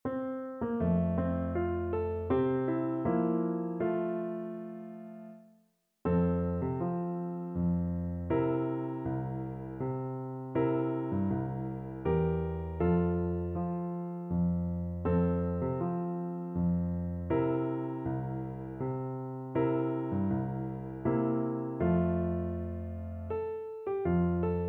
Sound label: es wearn FL kar, Flute